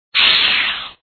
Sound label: Speed Booster; Tails Nightmare 2